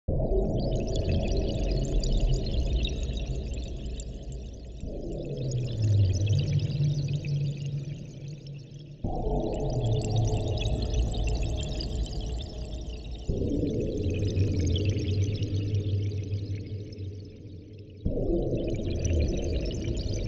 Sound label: Cube Ambience